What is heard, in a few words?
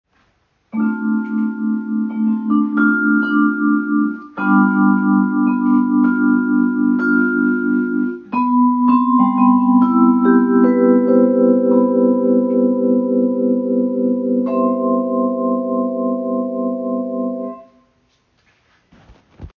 Tremolo